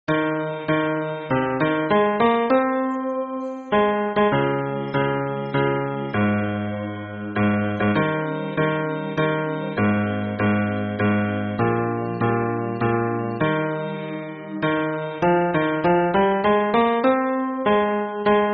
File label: hymn bass